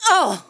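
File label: female hit; WarRock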